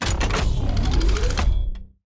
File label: collection open; Stellaris; grand archive